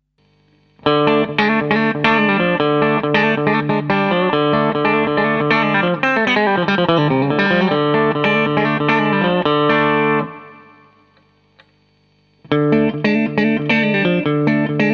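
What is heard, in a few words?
Tele 55 country bridge middle neck; Tele 55 country chevalet milieu manche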